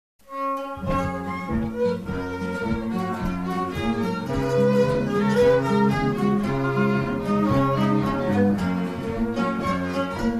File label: Polka